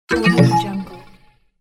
access denied futuristic ding

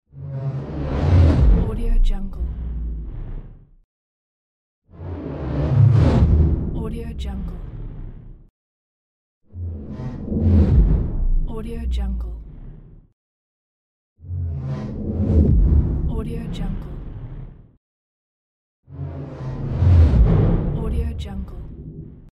cinematic whoosh

